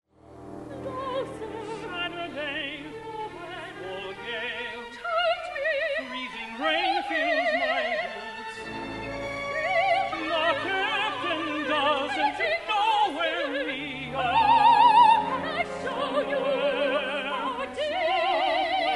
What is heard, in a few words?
Duet